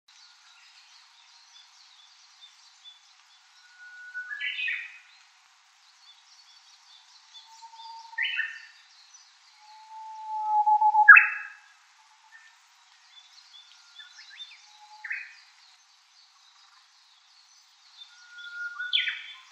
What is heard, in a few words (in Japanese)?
ウグイス, ホーホケキョ; スズメ目ウグイス科ウグイス属; 日本三鳴鳥の1つ; 「ホーホケキョ」は他の鳥に対する縄張り宣言であり、巣にエサを運ぶメスに対する「縄張り内に危険なし」の合図でもある; 早春に人里でホーホケキョの練習をしたウグイスは春の深まりとともに山へ帰って、巣づくりをする; 鳥の声研究室